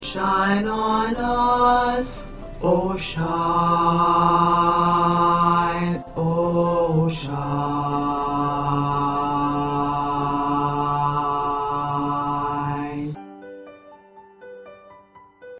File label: 2nd Bass